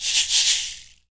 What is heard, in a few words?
shaker, shaker loops; Roland LCDP14 Africa VOL-2